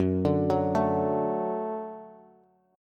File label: Gb Minor Sharp 5th Gb Bass; arpeggiated; JGuitar